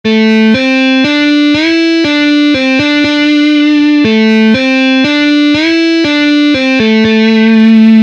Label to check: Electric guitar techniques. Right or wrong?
Right